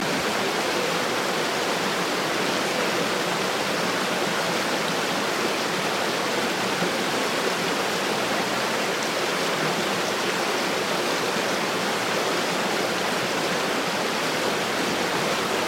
Large River Loop

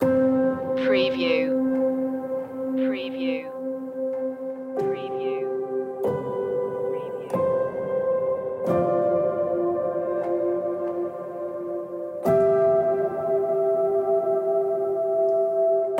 a new movement loop

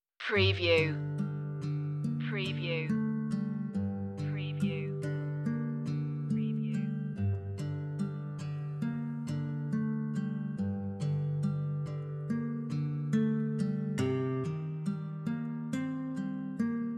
guitar only

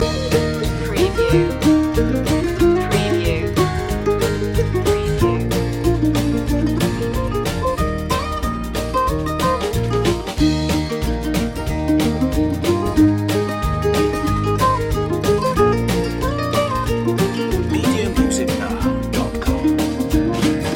country loop

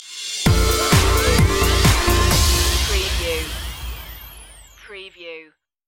laserbeam stinger

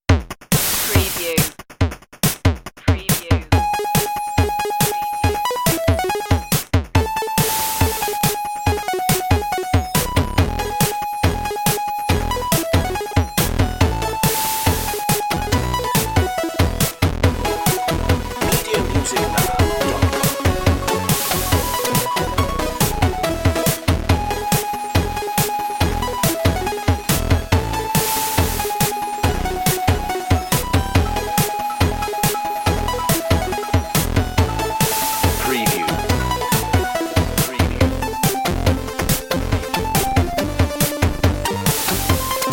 8bit jingle bells

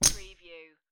Poker Chips Three Chips Dropping On Felt

